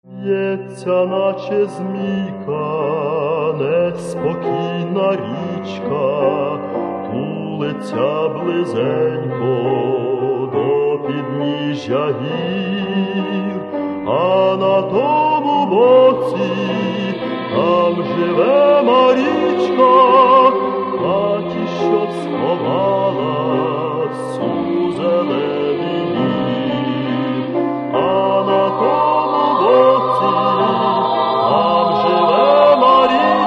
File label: romantic songs